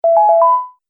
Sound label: Multimedia Correct, beep